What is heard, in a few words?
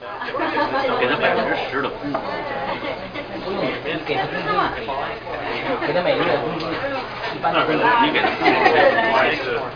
crowd; Thief